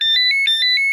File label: Notification Ringtone